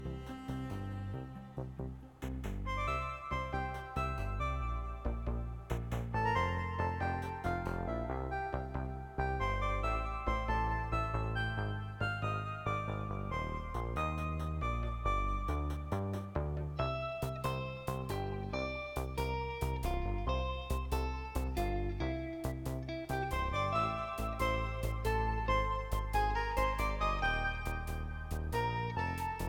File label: Uptown Upbeat (Chill Mix)